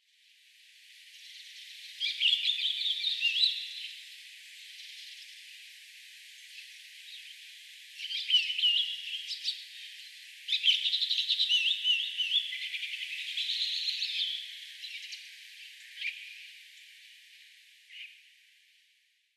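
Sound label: komukudori, Chestnut-cheeked Starling; koe; sapporo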